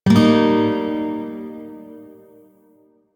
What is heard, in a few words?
Shawzin Blues Chord; Warframe; Lotus Shawzin